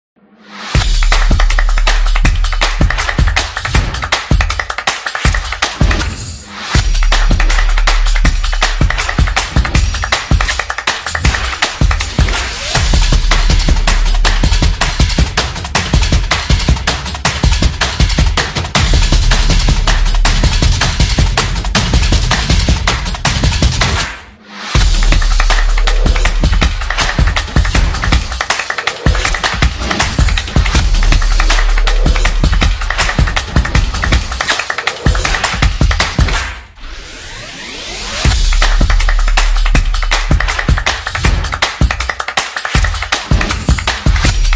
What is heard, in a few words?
Beat Fast; crossfit